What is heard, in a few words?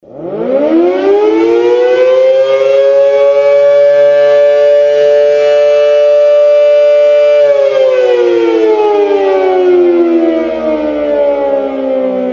Air Raid Siren